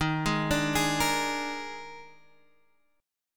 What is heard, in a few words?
Eb Major 7th Suspended 4th, Guitar, Half Step Down